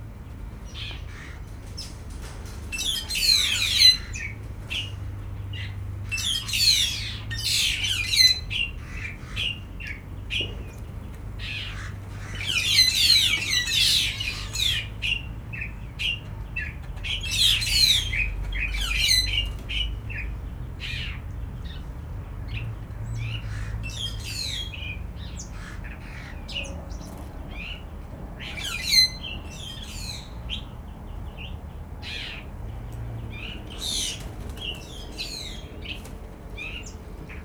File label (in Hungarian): csőrícsek tisztán; egyszinu kiraly csoricse; szegedi zoo 2011